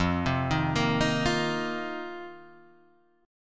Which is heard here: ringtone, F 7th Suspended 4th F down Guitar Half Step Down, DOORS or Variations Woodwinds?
F 7th Suspended 4th F down Guitar Half Step Down